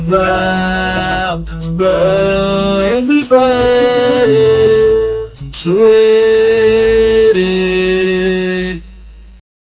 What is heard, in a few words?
baarish song